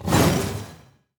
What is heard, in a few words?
basic attack cast; heroes; kosmyr